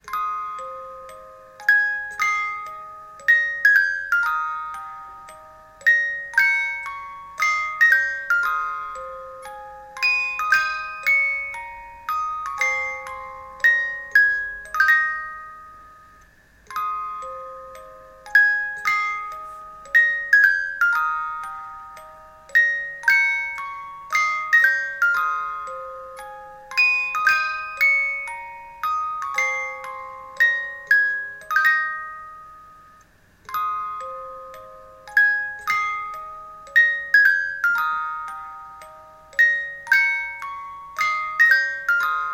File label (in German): Spieluhr